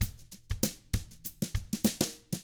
FUNKY, Drums

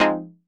stab haas, stabs

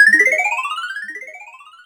KORG 01W KIT; Rubber Chicken Software Sample Bank

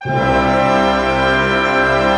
PENTA C FF, orch penta; Sound On Sound - Peter Siedleczek's Orchestra

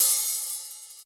Open HH, Yamaha RY20

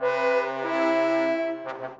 Brass

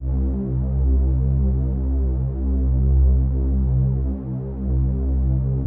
C1, SYNTH WAVES; Music Store Sound Service; Einstein

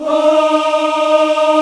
AFRO AH D#4, CHOIR AHS; Spectrasonics; Heart of Africa

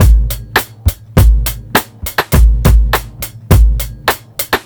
BEAT, Roots Funk; USB Soundscan vol.41; AKAI

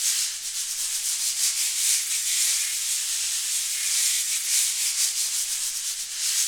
Af Shaker FX; Roland LCDP14 Africa VOL-2; Perc FX

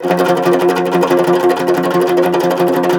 CUMBUS TR, Cumbus Tremolo

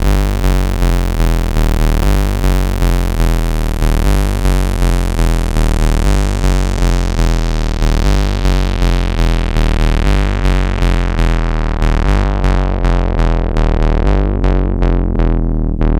C64 Bass, Commodore 64, Chiptune

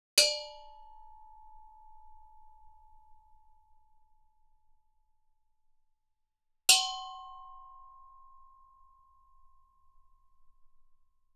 Gamelan; Propeller Island - Complete Gamelan

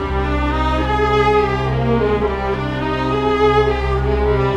Cinematic Strings, Orchestral

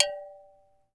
HIGH CUP G, CAMEROON; AKAI S6000 CD-ROM - WorldSounds; CAMEROON PRC

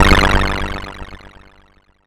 FX CHIPUP; ModelCycles

